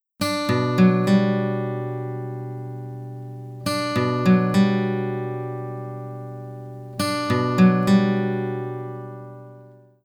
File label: chord pick; fretjam